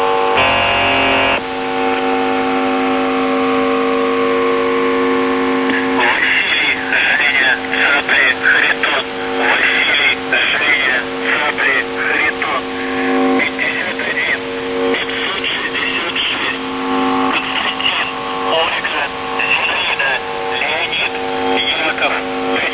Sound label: buzzer usb msg